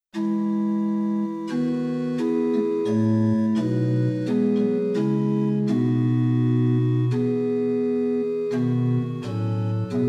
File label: Pitch 440 Temperament Werckmeister; Werckmeister 1-3; hymn